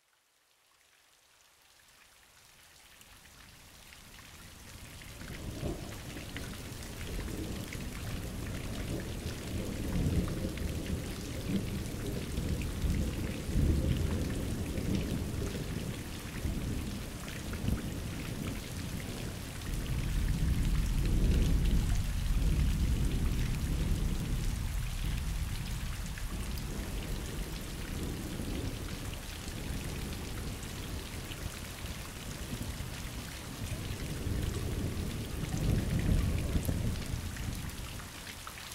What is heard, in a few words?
rain sound; صدای باران